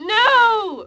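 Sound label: bail vox female; skater; Tony Hawk's Project 8; XBOX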